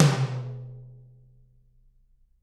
TT, Toms; Bob Clearmountain Drums I

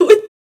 Dance Vocals, FEMALE

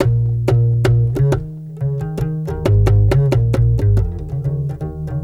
World Lick

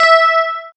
GUI, E-Guitar; Sound & Vision; Gigapack I CD 2; GUI E-GUITARS; GUI E-Guitar 1

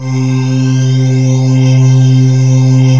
PHAZED OUT, Yamaha W-7; Rubber Chicken Software Sample Bank

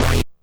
Synth Hit E

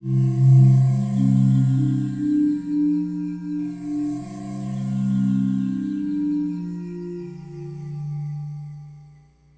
Batur Walk, Ubud Loop; Sonic Foundry (Sony Creative Software) - Friendly Fire

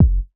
Dance Kick; Roland BK-5

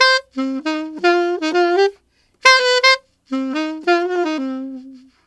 Alto Swing Shuffle in C min7, Sax; Sonic Foundry (Sony Creative Software)

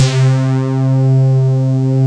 RAVE; Pro-Rec - Synthsonic

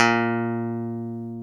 A#1 pick, bass

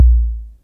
SYNCUSSION

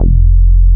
BASS F2 P; MR.J BASS

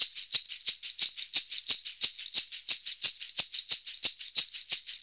SHAKER, Modern Percussion Loops

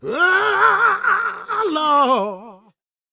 gospel male, vocal; ILIO; Vocal Planet

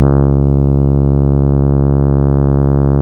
C#, Synclav FM, BRASS; Big Fish Audio

